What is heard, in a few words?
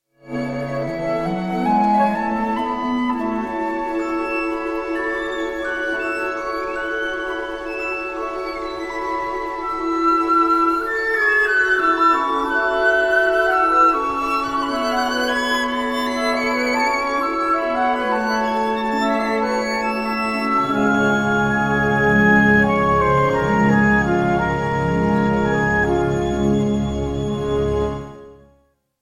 violin concerto no 2 in d major; mlynarski; op16